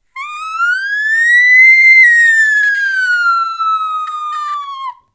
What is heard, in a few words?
whistle voice